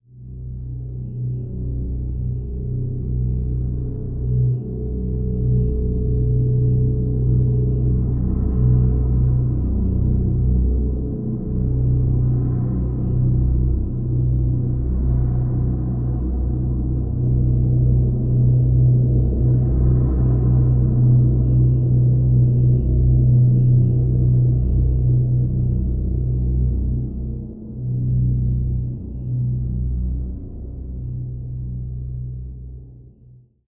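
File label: drone B; Drn Caverns Deep B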